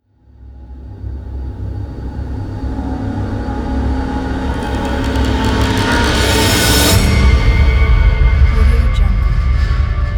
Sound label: horror intro ident